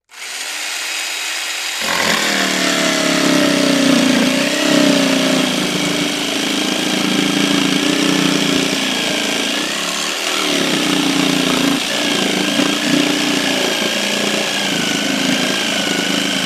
Electric Jig Saw

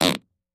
Close Up Fart

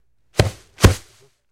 Football Hit Bounce

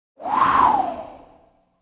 WEATHER - WIND GUSTS: Individual gust of wind.

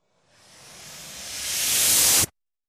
Strange Reversed Air Releases Vary In Pitch; X10

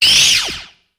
Medium Laser Zap

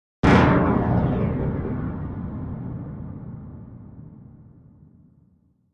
Impact Metallic Thud

